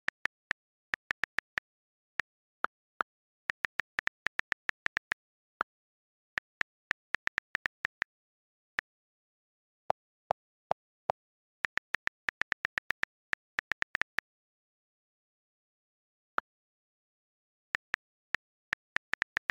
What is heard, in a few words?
Iphone Typing